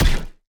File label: Enemy Wall Bounce; bloom minigame